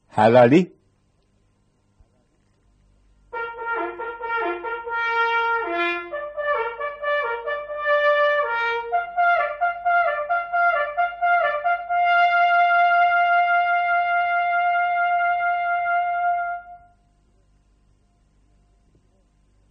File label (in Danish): halali